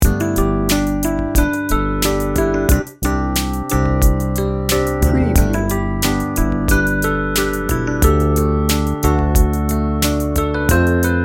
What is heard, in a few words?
mellow reflections loop